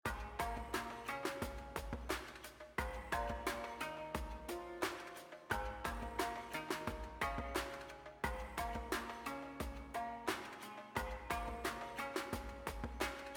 BGM Paytable; slots; Panda Majesty2

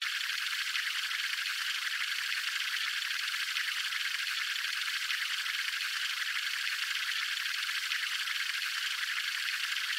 Reel Spins; slots; St Paddys Day Blast

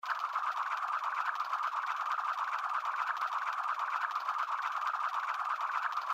Reel Spin; Chic Town

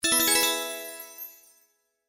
Jackpot Symbol Win; slots; Pirate Quest

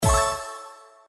land bonus; Monkey Spin

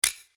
Basic Button; slots; Robot Vs Monster

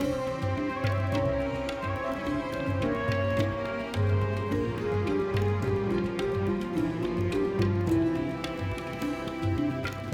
BGM Bonus; Genies Wild

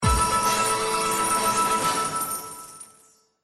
Big Win; slots; Big Paul

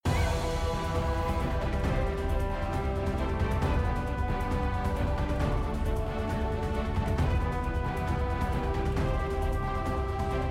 BGM FREESPIN, slots; Monkey Spin

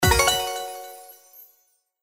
win high; slots; Thanksgiving Blast

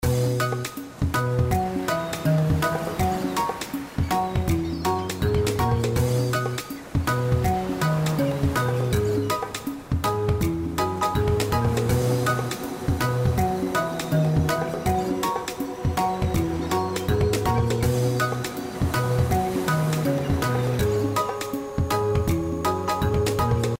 BGM BONUS; Jamaican Jackpot

